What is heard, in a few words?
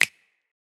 snap; eddyflux; dough-samples